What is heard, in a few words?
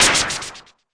mbrute noiz, Arturia MiniBrute; DRUM MACHINES